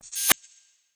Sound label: Hihat; Ghosthack Advent Calendar Day 4